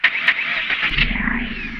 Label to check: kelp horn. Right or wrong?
Wrong